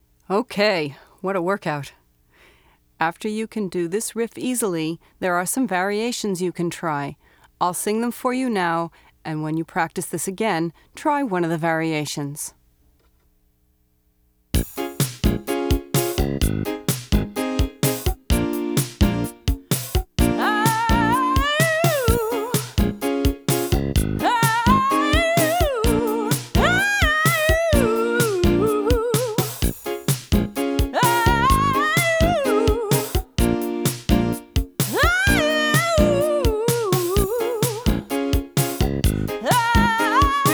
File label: Variations of Funky R B Riff